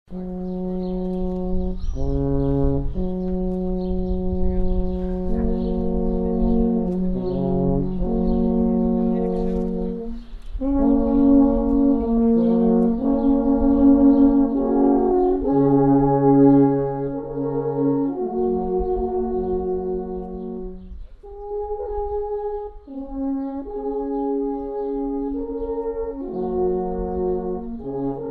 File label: alphorn